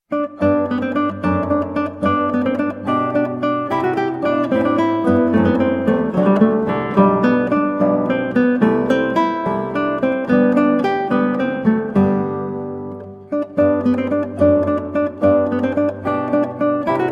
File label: Allegro, guitar, spoken; Partita 2 in G TWV 41 G2; Georg Philip Telemann